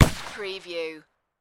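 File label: Pistol Ruger 357 Single Shot Designed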